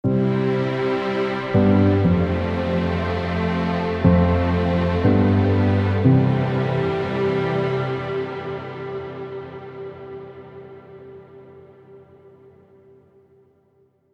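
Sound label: Elegant Cinematic Logo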